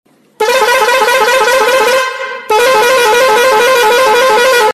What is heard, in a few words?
trailer truck horn